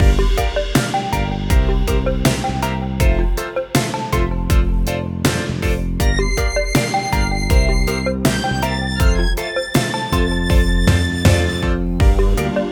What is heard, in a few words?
Instrumental